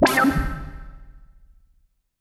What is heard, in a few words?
C3, SynthLine; NiceBeats